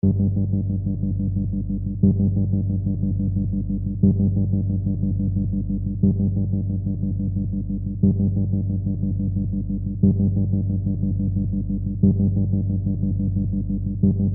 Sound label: A short mystic, cinematic intro soundtrack; Apilonius